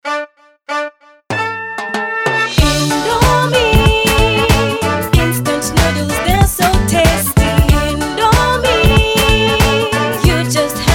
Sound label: JINGLE; INDOMIE